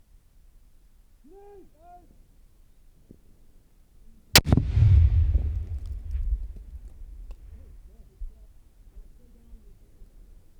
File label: L109A1 HE Grenades, 15m; MIXPRE; H4N; U87; Brecon 2015; WEAPONS RECORDING PRIMER